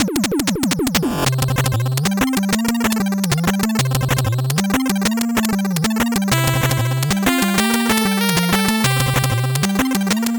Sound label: 8bit bgm fast